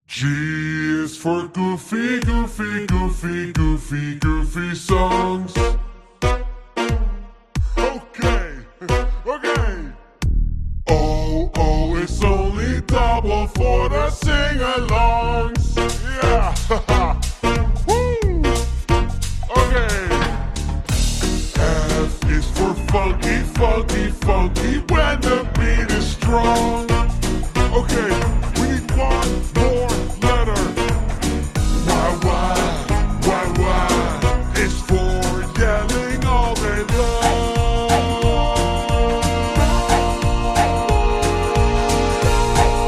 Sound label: goofy song slowed down horror